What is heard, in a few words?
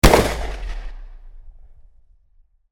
12 Gauge Pump Action Shotgun Close Gunshot